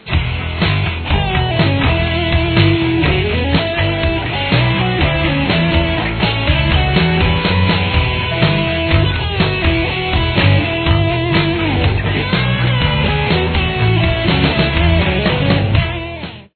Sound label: pentatonic major comparison, guitar